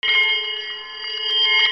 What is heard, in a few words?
BELLS